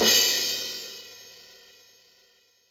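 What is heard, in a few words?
Crash Dark, Yamaha DTXpress Cymbal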